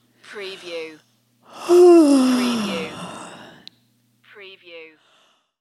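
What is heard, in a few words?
Female Yawn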